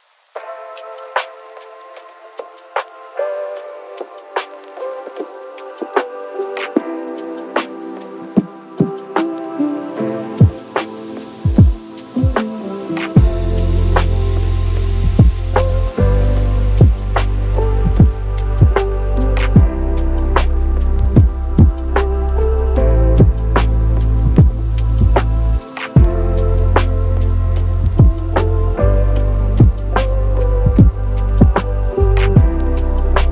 Urban Serenity Beat Chill

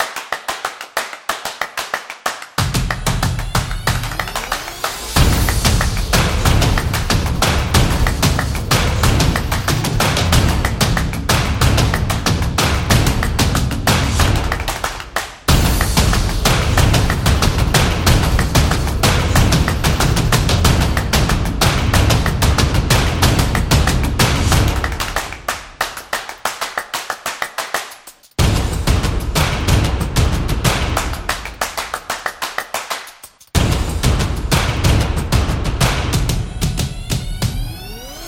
Dynamic Music

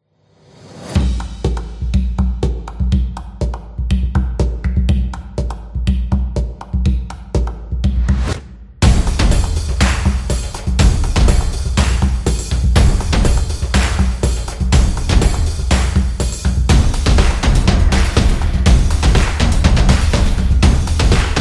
Beat Music; fast tempo

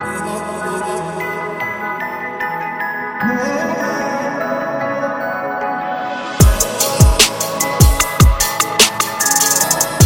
Fashion Beat

